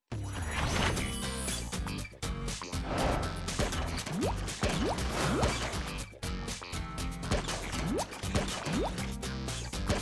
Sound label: Goo Gun; Disney Infinity